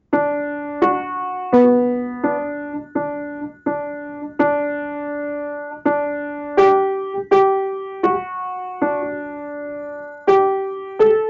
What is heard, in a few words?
piano